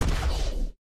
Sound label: Raygun Fire; Criminality